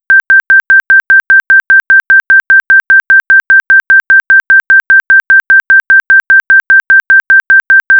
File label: IFSC frequenzy and duration conform false start sound; ScStw